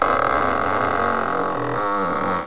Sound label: squeaky; ambience